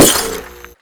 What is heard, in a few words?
lamp break